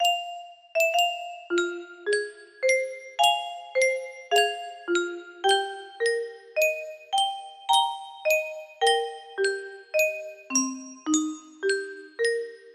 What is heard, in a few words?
music box melody